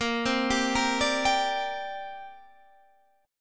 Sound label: C 7th Suspended 2nd Bb